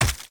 Enemy Damage; Hollow Knight

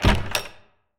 UI Menu UI ONESHOT; Monster Jam Steel Titans

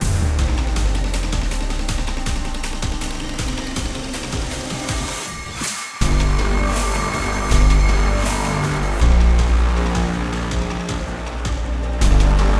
Fight 160 Bpm; Sonar

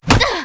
Generic F damaged

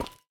Footstep Solar Panel

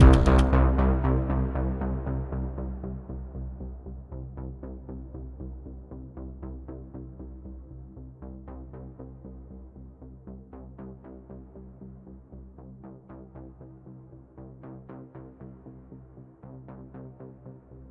13 Bars 117 Bpm

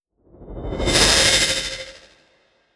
Enchant Frozen Blight

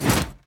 Rogue Dash; Pecheneg

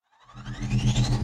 harpie charge

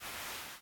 Beanbag Stand

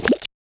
Chaser Grab; gamerip

